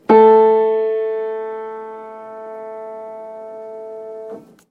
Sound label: Spinet A3 A4 median just octave, piano